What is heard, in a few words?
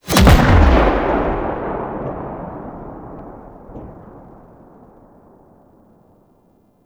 Drop Ship Warp Out Boom; Warframe; Vehicles; Grineer Drop Ship